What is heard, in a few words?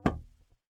noise muted strum, swag bass